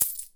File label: coin; Balatro